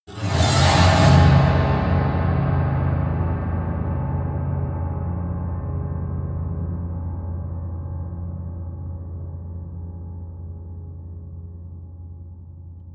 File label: piano twang fast squeal long